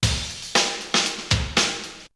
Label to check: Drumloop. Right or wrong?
Right